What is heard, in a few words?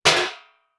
Trash Can Lid Fall To Ground, Household